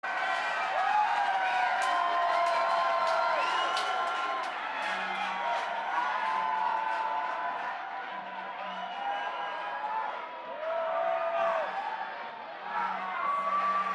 Banter Crowd

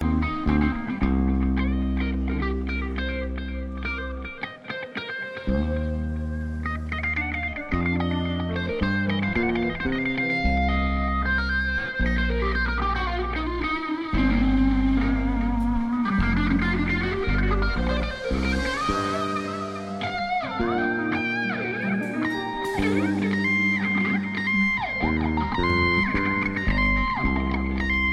tuning

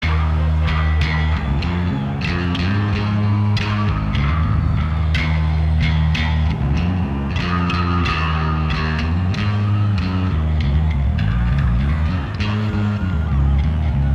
Bass Solo

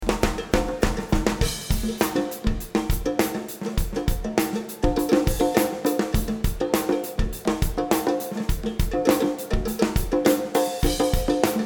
Drums

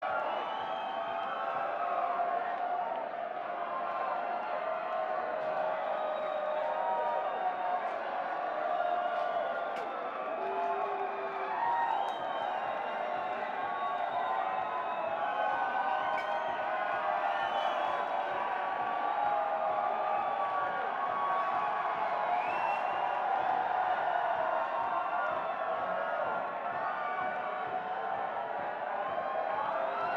Crowd Banter

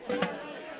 tuning

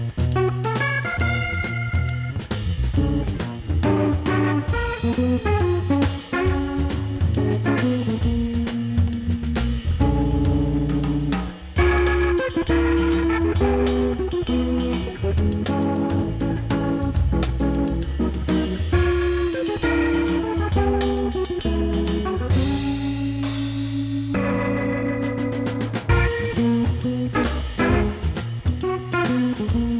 Percussion Shuffle

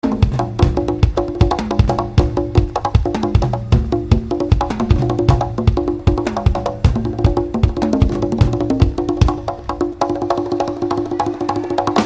Percussion Jam

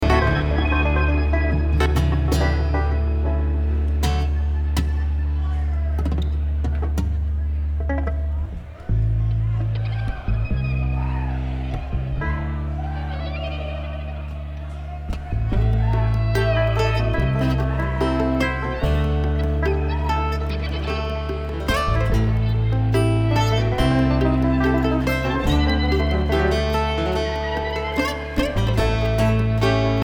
Traffic Jam

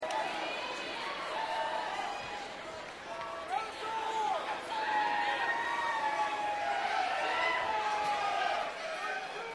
Crowd

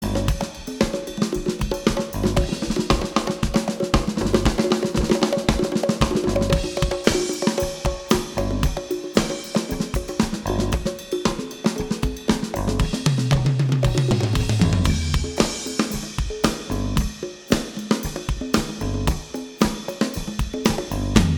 Drums and Bass

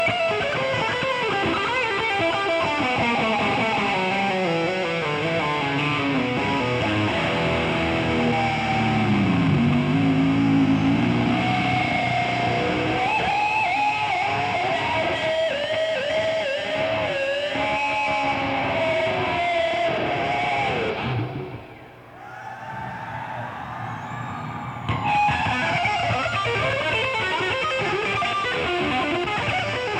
Guitar Solo

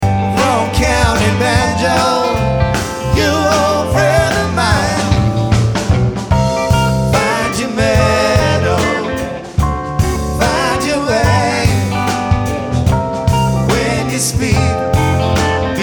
Banjo

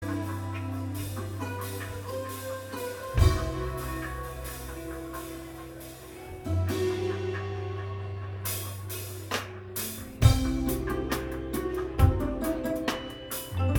Improv Jam

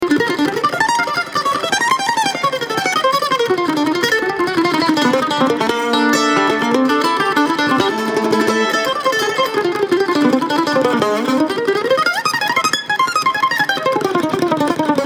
Kentucky Mandolin

